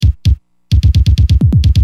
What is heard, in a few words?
Drums, Perc, Industrial